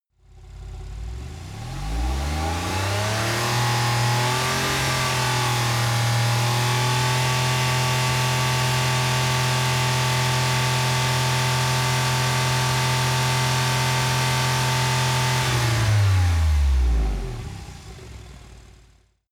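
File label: sports car exterior engine rpm step 6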